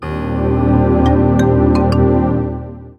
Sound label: STARTUP SOUND; Sony Ericsson U100i Yari